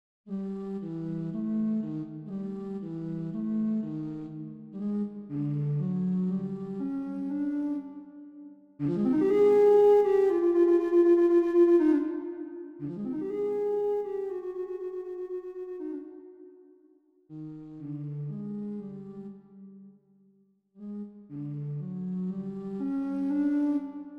Bass Flute

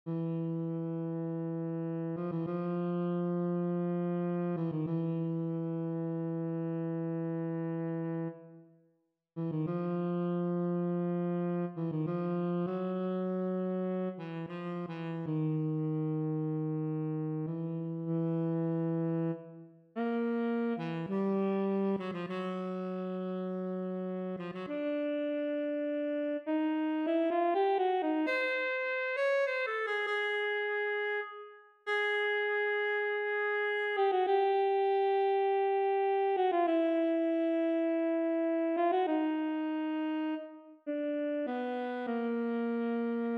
Tenor Saxophone

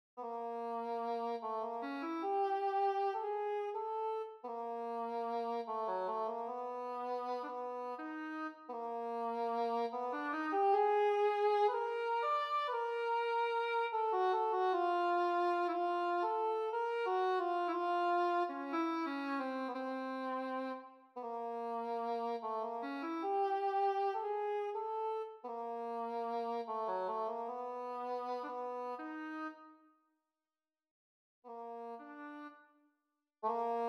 English Horn

